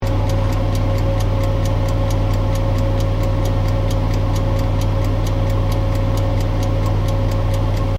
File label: OVEN FANFORCE OPERATING DOOR CLOSED LOOP; Common oven sounds; Appliance Sound Effects; Appliances (Sound Effects:Household)